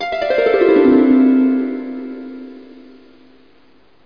harp

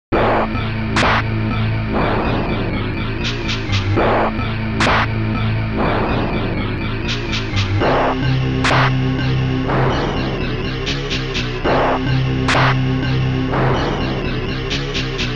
led music

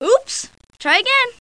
wrong; With Open Eyes - The Art Institute of Chicago

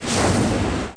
thrust

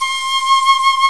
Piccolo "w_vib" C6; Indiana Jones Demo; iMuse

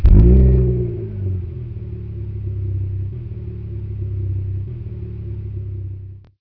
car; phoenix-end

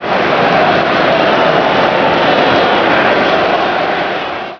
throw; SMSoccer

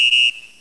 WHIS

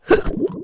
gurp; QUAKE2; megaman

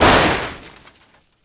met drum; Shadow Man

